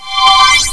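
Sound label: wave sweep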